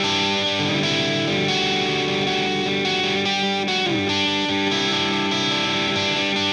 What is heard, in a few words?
Silos Lead Guitar Riff, Indie Rock